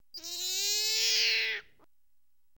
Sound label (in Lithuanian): Auksagalvė liūtbeždžionė